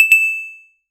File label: clean fantasy pickup sound, crystal chime